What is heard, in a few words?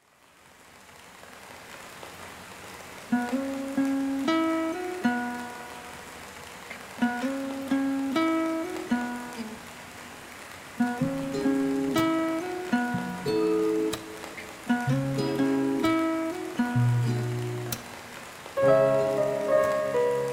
rain lofi